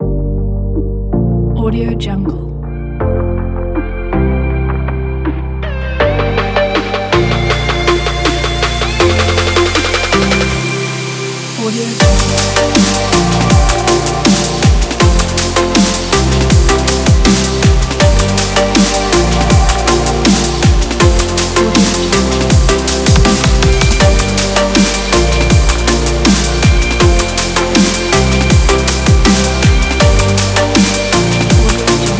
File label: future electronic